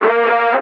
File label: vocal Bb; gouda; SD AURORA Sample Pack; Slate